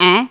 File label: Eh; Bath; Neighbours From Hell Console Edition; GC, Xbox